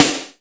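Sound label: PWR snare, GM drum set; AKAI S-Series CD-ROM Sound Library VOL-8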